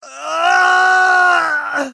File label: scream, Screams; Voices; civ13; civ14